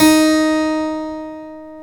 KEY HRPCR, Roland; Roland - LA Composer vol. 1

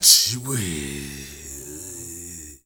MALE SOLO, MALE NOISE, Heart of Africa; Spectrasonics; Heart of Africa 1

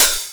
Open HH, Cymbals

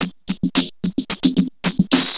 Vocobeat; Sonic Foundry (Sony Creative Software)

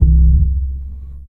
Single Acoustic Basses

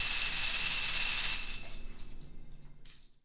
Tambourine Roll; Essential Percussion

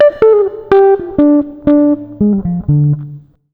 120 JAZZ, Electric & Acoustic Guitar Loops; USB Soundscan vol.04; AKAI

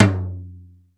BEAT KITS, T; Crypton - DrumBase5000

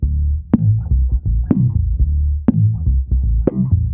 IN HOUSE, Bass, 124 BPM; Sampleheads; Ultra Freakin' Bass

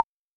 Bongo, Acetone Rhythm-King

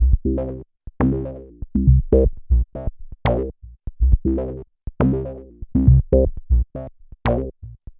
Bassline, Prototechno; Sonic Foundry (Sony Creative Software); Basses

